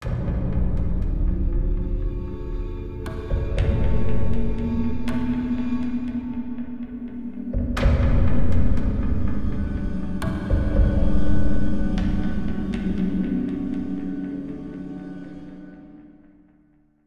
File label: Wasteland Front